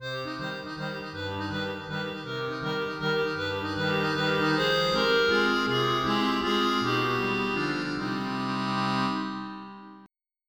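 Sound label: accordion fr; Roland - An Invitation To The Roland World Of Music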